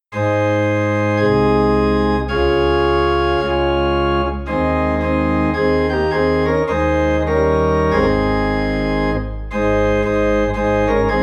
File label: Organ in A Flat; Leaning On the Everlasting Arms